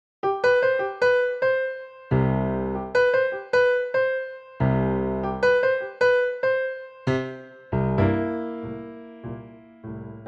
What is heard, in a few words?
piano blues